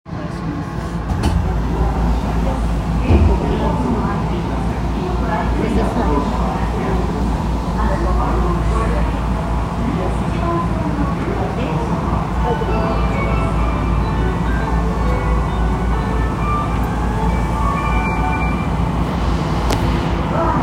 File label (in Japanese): 銀座線銀座駅西, train sounds